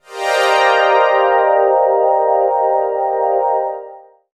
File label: Cmin 7th, Pads; Jungle Warfare 1&2